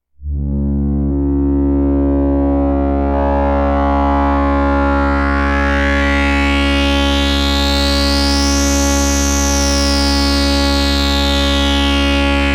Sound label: cmosed ms20 filter